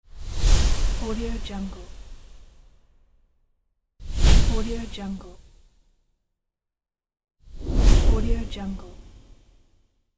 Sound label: soft whoosh